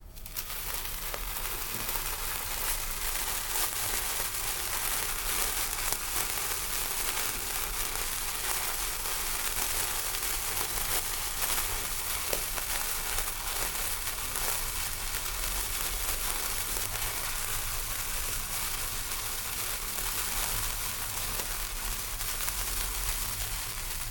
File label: handy firework